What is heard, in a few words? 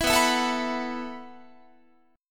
B 7th Suspended 4th B down Guitar